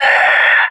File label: death; fbihrt epic